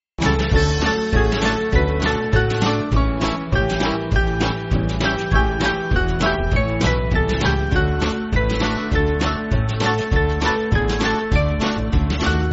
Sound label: Band